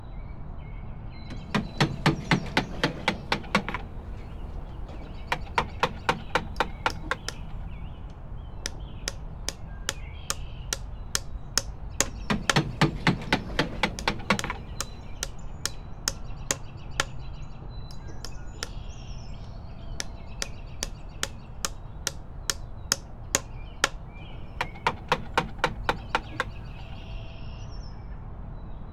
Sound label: stall building